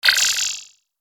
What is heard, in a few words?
Futuristic digital switch turn on